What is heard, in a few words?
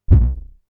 bd rumble, Drum Machines, Formanta Radio Plant Polivoks